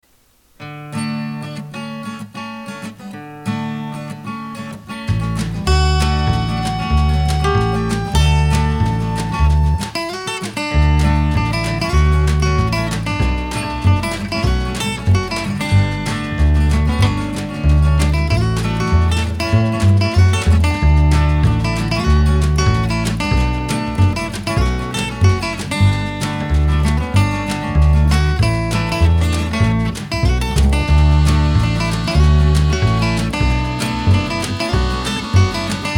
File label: acoustic; all acoustic this time; alt. instrumentation with snare, better mixed accordion